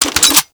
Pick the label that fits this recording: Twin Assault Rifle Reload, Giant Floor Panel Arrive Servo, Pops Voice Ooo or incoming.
Twin Assault Rifle Reload